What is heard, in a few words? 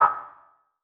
Multimedia Internet CD-Rom Flash Click Mechanical